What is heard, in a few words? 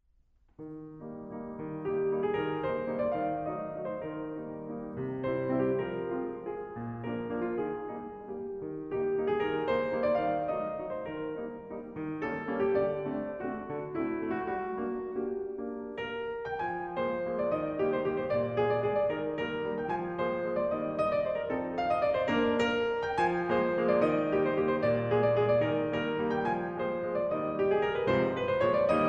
Waltz